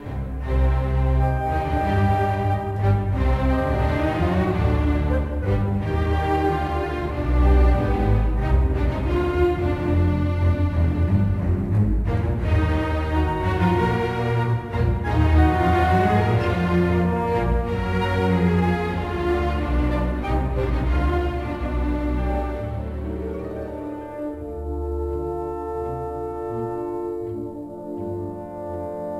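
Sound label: Droidbattle loop; Star Wars Episode III Revenge of the Sith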